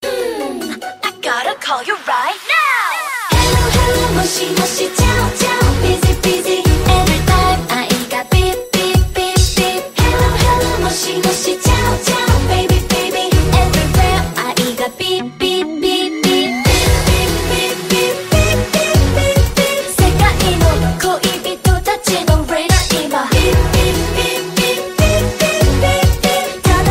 beep beep

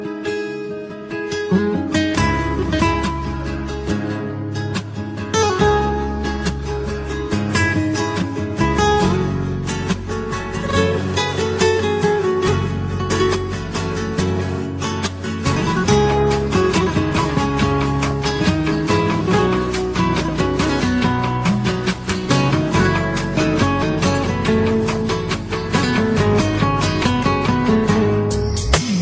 sad guitar music

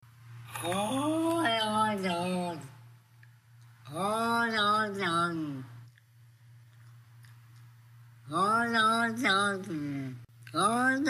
cat; oh long johnson

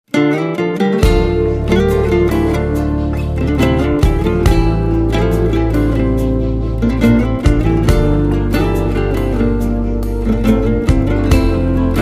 guitar and trumpet

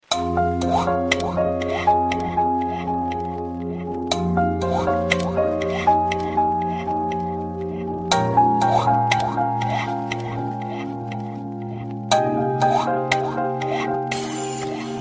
3d drops sms

